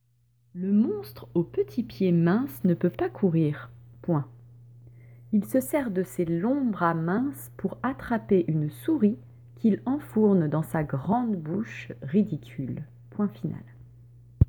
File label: Vocal